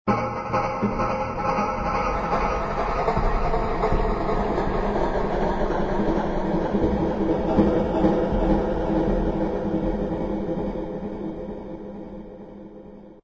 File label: ambience ruin